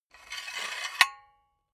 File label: silicone gun empty pusher pull out slow